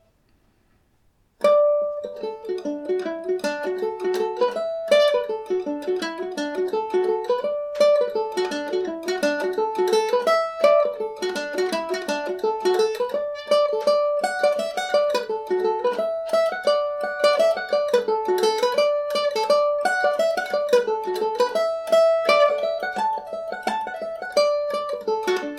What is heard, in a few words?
reel, Irish mandolin; charlie harris